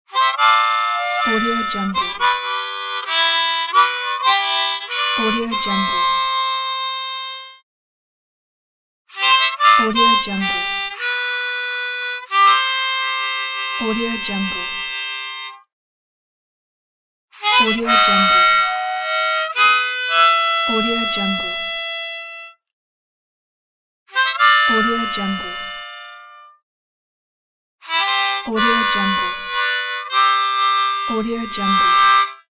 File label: harmonica scene transition